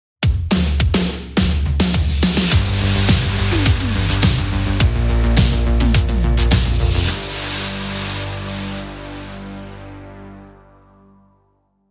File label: Arpeggio Flight